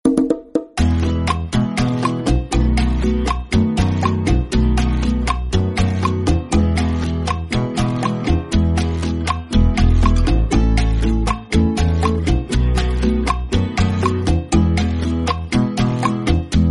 mexican guitar

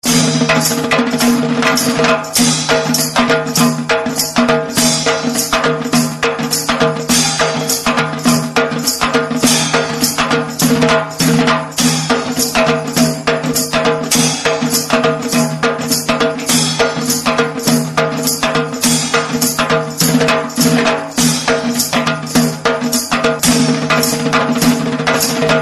marathi halgi tone

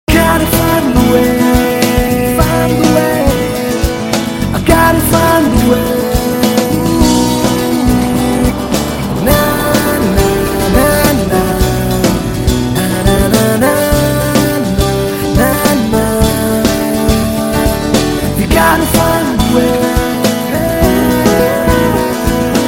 california guiter music ringtone

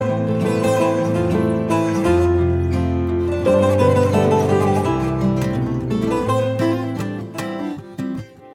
mix ringtone

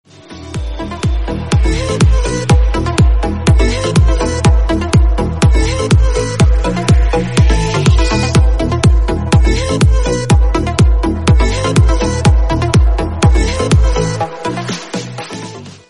electro ringtone

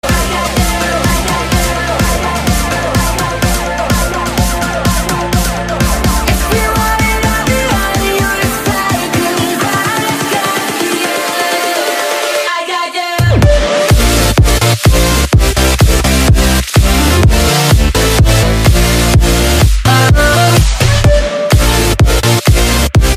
electro ringtone

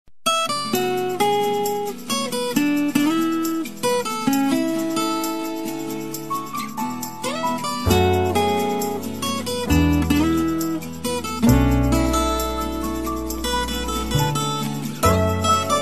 new acoustic nokia 3 ringtone

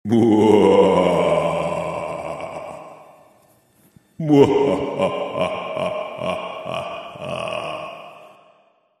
evil laugh ringtone

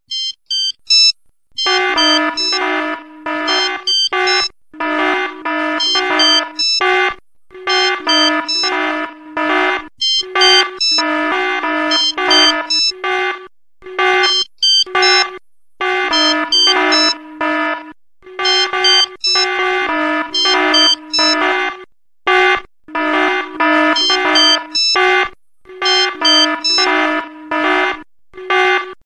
iphone 6 alarm clock ringtone

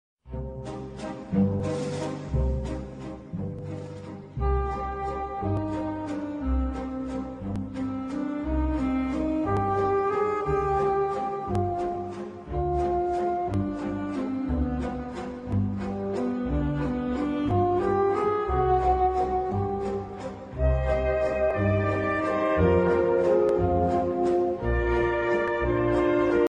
waltz ringtone

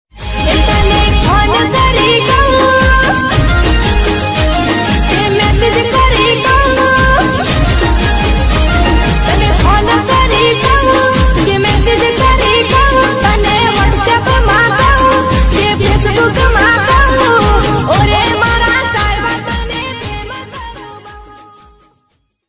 Gujarati breakup ringtone

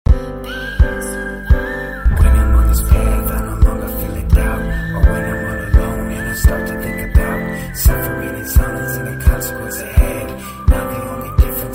solo tone ringtone

